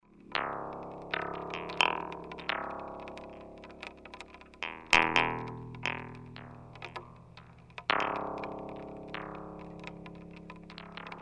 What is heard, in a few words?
rain plucks